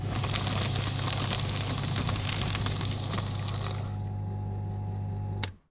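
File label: shredder; 1000fx; OFFICE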